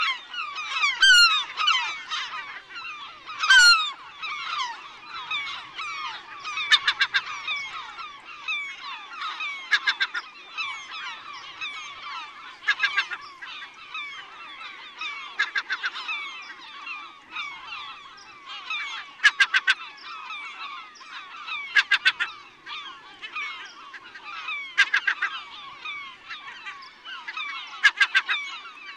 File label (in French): goeland argente appel